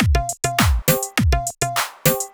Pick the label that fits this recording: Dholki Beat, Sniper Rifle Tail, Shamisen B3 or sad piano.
Dholki Beat